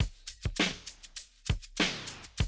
drum loop, 100 BPM

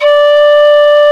PENNY WHIST; Penny Whistle

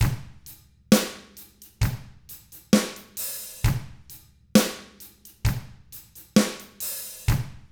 SLOW ROCK, Drums; ILIO; Double Platinum Drums 1

